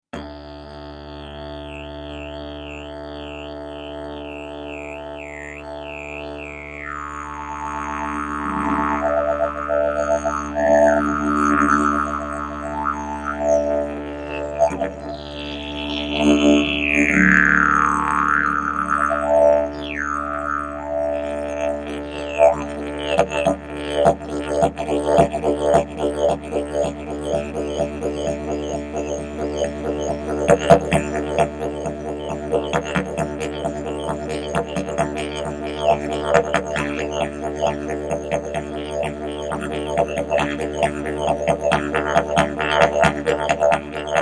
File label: didge